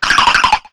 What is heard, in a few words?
baltoy; cries